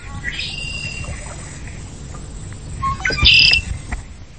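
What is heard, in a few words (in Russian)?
свист и трель, доносящиеся из стаи черных дроздов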